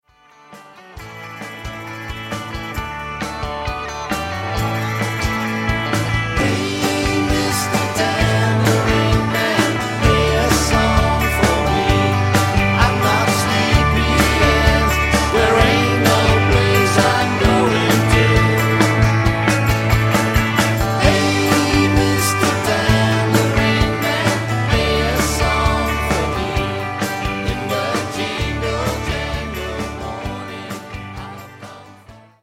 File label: tambourine